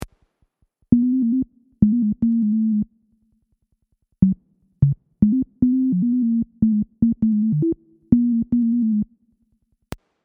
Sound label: tones